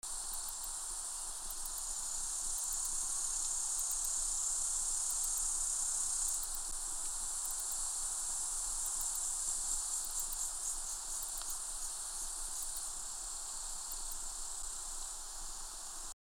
Barbitistes serricauda, Tcantans; Thermenlinie

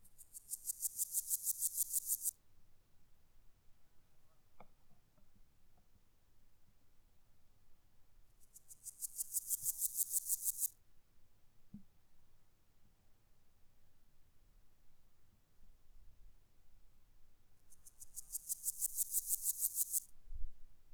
Podismopsis styriaca; hohenwart; MSehnal

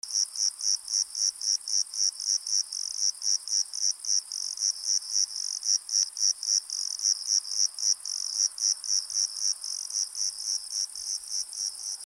Eumodicogryllus bordigalensis; Nordburgenland

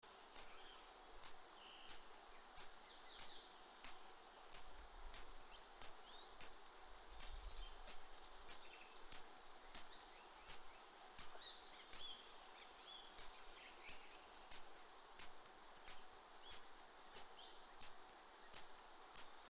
Isophya camptoxypha; Leithagebirge

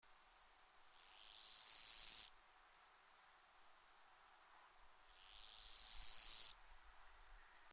Stenobothrus nigromaculatus; Thermenlinie

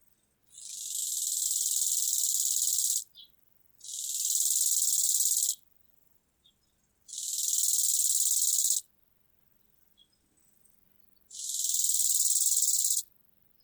chorthippus biguttulus, orthoptera

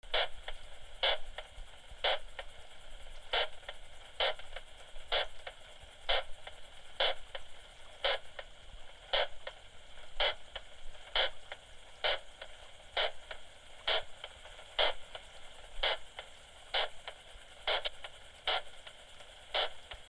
Isophya camptoxypha, Thermenlinie

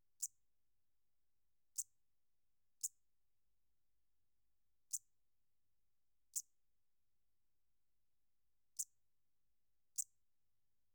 Eupholidoptera, Labor 28 Grad; Wien hilton; MSehnal

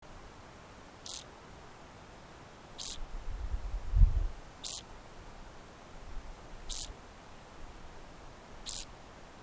Chorthippus brunneus; WSchweighofer

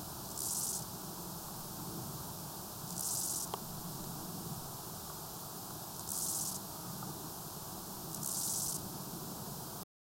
Chorthippus dichrous; Seewinkel

